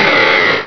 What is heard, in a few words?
Cry; Pokemon Iberia V1.03; Pokemon Essentials